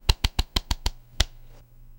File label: sound when hovering over, card games